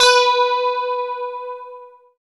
GUI CLEAN, E-Guitar; E-GUITARS

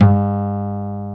AKUSTI, BASS; Soli-Music; World of Synthesizers VOL-1

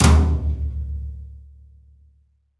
New Brush Flam Tom, Roland BK-5

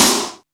SNR ROM; Back In Time Records - Big Bang

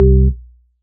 org c3, M1 Organ, KORG DSS-1; Best Service ProSamples vol.55 - Retro Sampler

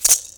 SINGL RATTLE; Best Service; World Colours

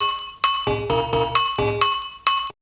Percussion; Coldcut's Kleptomania VOL1

